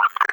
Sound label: vox idle, creatures, snark